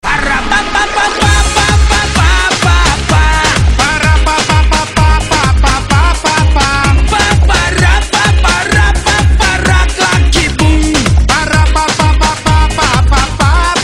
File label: Rap Loop